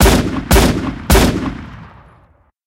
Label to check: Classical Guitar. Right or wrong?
Wrong